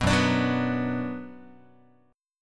Gb Suspended 4th Sharp 5th, Guitar 4A,4E,5A,5D,5G sharp,6C sharp; strummed; JGuitar